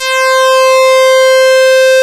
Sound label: PULS, SYNTH; SoundFactory